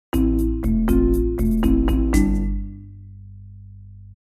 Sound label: percu